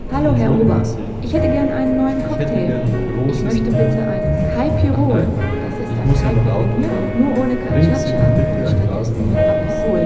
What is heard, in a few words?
Cafeteria